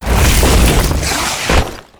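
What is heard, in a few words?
miner spawn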